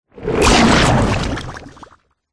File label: cartoon slime monster bubbling wet gloop hit